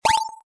purchase; Mindustry

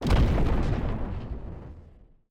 block Explode Flammable; Mindustry; explosions

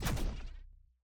shoot Payload; Mindustry

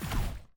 walker Step Small; movement; Mindustry

